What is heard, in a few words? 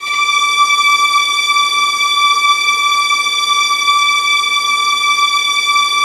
VIOLINS, Synclavier Strings; ILIO